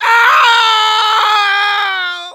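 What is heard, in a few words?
pain critical death, de; Soldier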